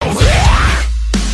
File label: metal blegh